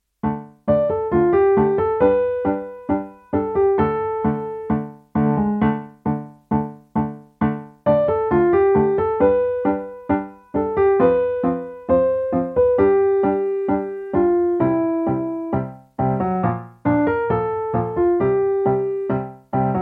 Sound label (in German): Dorischer Tango